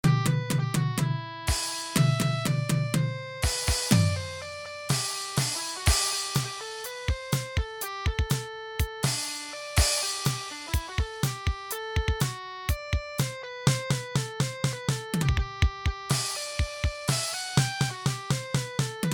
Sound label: Electric Guitar and Drums